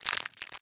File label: Eat